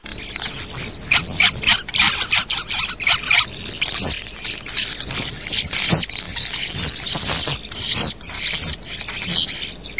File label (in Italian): STORNI